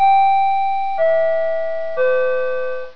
three-note chime (G, E, C downward) announcing the closing doors; metro